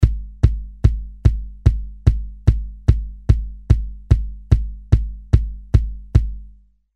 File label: kick